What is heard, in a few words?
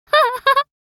Teen girl joyful laughter; laugh sound effects; gfxsounds